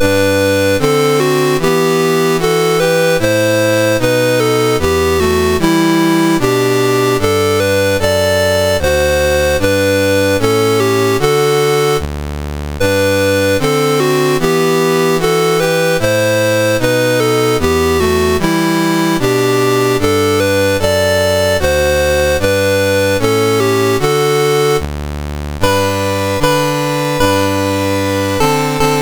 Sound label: Yugoslavia, National Anthems; MOS6581R2; Dave Marquis